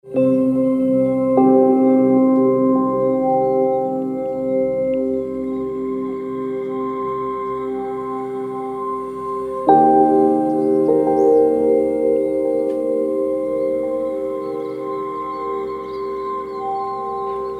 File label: Sample Music